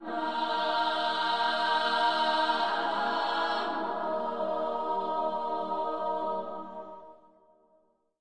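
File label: Slavic Choir E flat Phrygian Cadence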